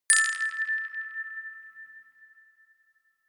notification glockenspiel rattle fourth

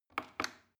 plastic button small click regular slow

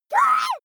child shriek

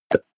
pop

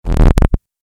vinyl rubbing

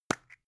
plastic spray can small open

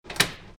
door lock

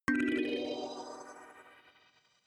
notification kalimba magical third

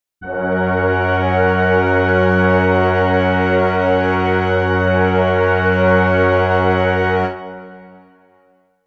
brass chord f sharp major

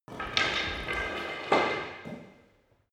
staff wood drop

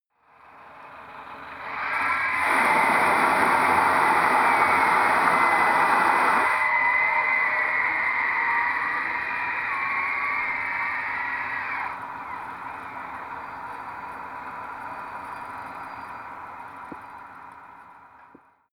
harbour

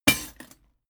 impact chain leather

